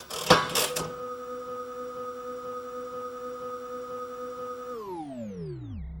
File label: wheel